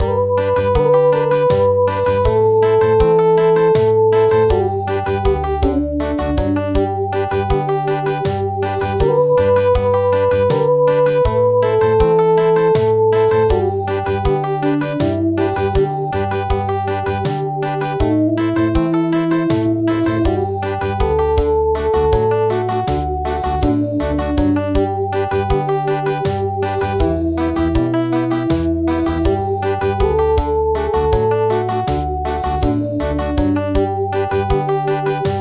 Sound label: Country; My dear mothers child